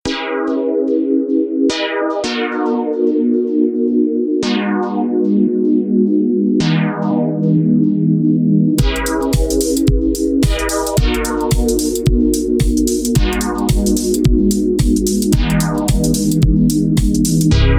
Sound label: techno trip 432 hz